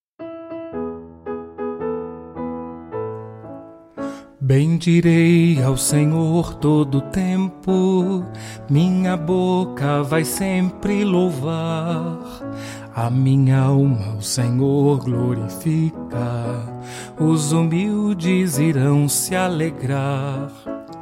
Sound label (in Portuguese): canto de comunhao; missa de nossa senhora aparecida 2021 do ano b; bendirei ao senhor